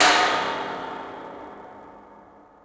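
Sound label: ambience interior clank; Tony Hawk's Project 8; XBOX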